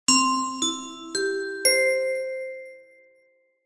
Depart announce start

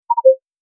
pipo

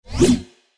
von sword

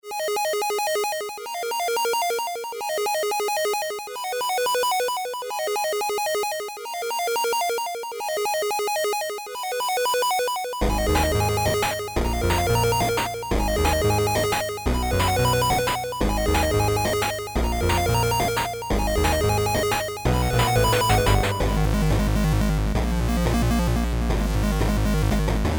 digital big war

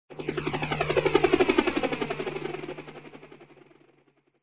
Helicopter

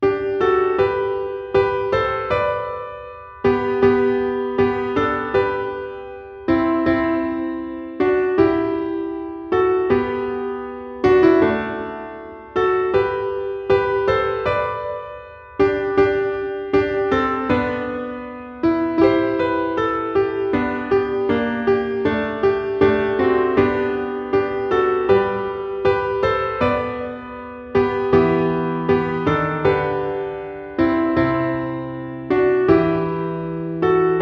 Gentle piano solo

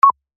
pipopa

